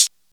soft hihat sample f key, Royality free foot hi hat sound tuned to the F note.; hat samples